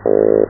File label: Argyrosomus regius Field Sound